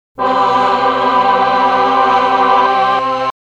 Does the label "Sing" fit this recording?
Yes